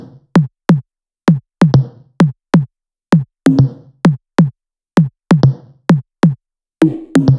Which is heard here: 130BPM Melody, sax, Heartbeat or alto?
130BPM Melody